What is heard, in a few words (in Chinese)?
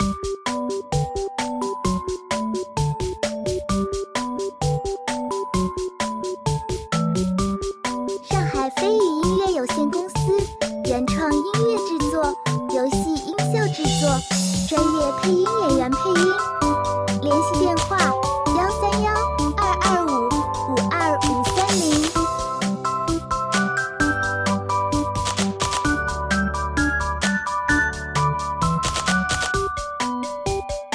Q版可爱明亮节奏; 别惹蘑菇2; forest